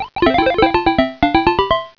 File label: door door; ドアドアmkII; V1Hモード：PSG音源; ＰＣ８８０１